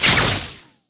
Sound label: imp bullet lightballistic shieldwall sizzle; Titanfall 2